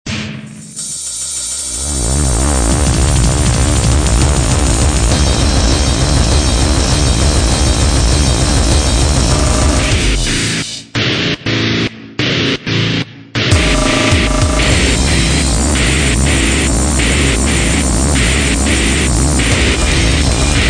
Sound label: drum machine gun